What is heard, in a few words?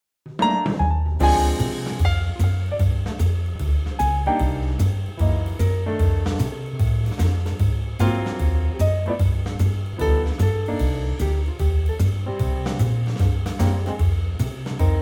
swing; key - Cm - vocal range - G to Bb; Cool swinging Trio arrangement of this hugely popular evergreen.